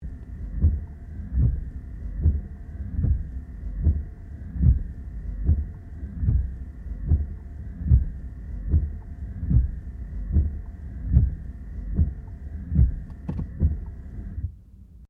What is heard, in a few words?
Car Toyota Corolla windscreen whiper interiro; 2009 Toyota Corolla hatchback; Cars (Sound Effects:Vehicles)